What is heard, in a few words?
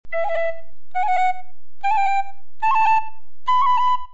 short rolls